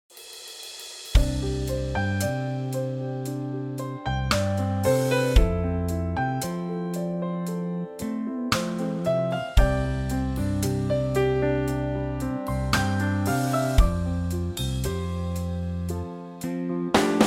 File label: trio C